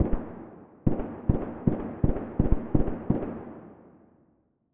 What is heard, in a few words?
ar 15 silencer 8 rounds gu